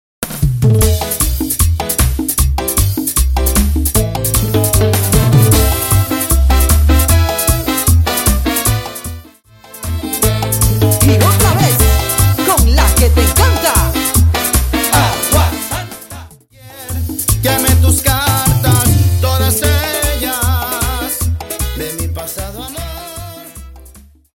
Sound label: Intro Simple 153 Bpm